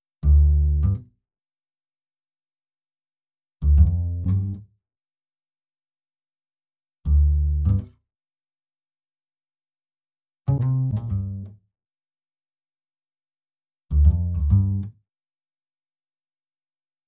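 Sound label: Double bass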